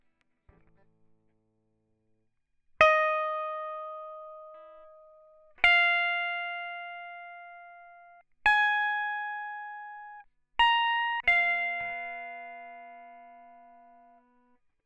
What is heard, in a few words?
plucking; logic